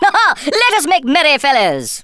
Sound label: laugh; Worms Armageddon; Thespian